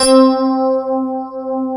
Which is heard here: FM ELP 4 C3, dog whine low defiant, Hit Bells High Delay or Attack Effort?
FM ELP 4 C3